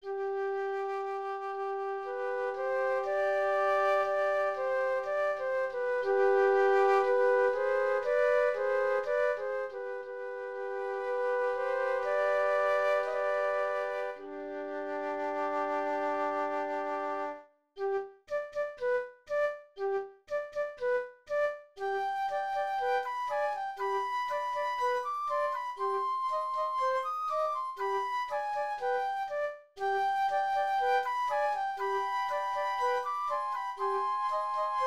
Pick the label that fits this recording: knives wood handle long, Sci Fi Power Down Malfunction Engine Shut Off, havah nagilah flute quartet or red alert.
havah nagilah flute quartet